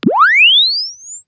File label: Press Two Sides Chirp; Samsung 4 Recordings